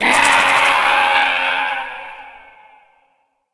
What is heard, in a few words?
falling, reptilian